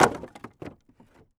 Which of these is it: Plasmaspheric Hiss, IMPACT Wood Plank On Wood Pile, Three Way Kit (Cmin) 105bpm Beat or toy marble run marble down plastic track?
IMPACT Wood Plank On Wood Pile